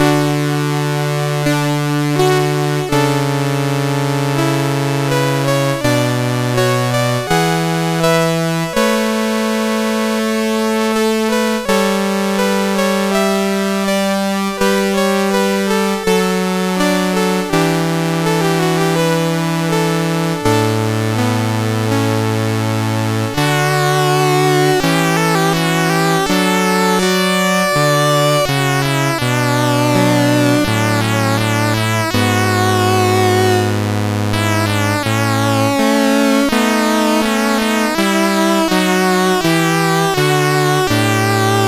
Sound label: Anthem, CSG8580R5